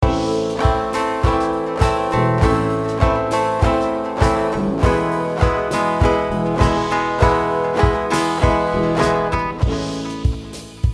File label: Band; Play along zum Spielbuch Band 1; Begleit-Musik